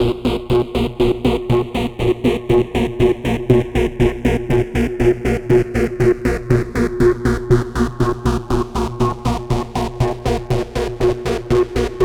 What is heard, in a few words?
Filtered Sweep, Trance; Sonic Foundry (Sony Creative Software); Trance NRG VOL-2